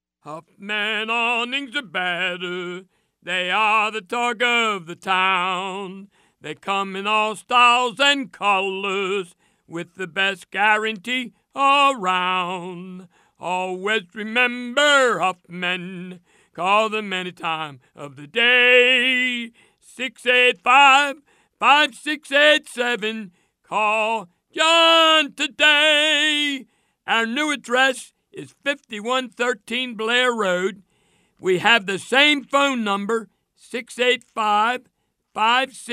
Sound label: jingle; hoffman